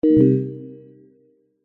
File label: notification digital bell warm negative